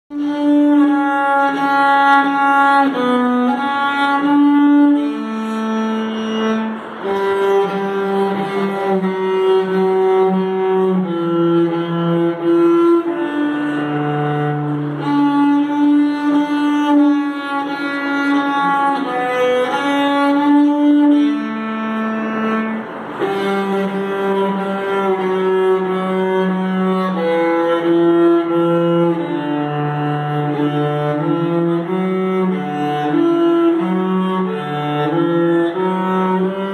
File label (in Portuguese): violoncelo